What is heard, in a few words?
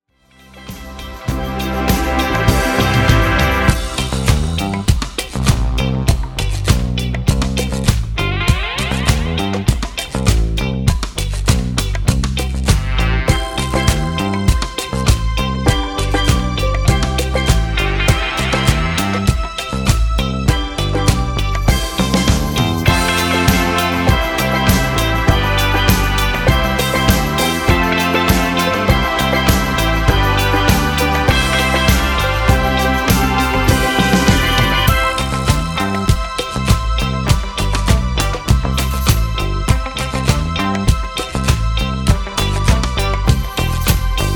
Antipodean Instrumental Sample